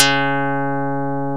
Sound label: HARP KARPLUS